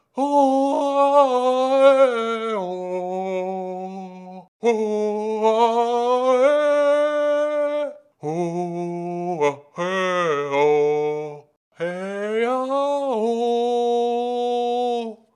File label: Minister sing; Hollow Knight: Silksong